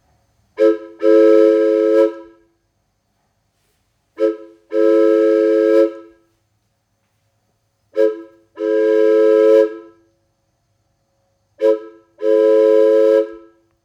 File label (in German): Signalpfeife Lokomotive